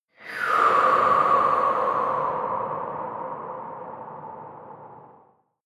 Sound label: air breath release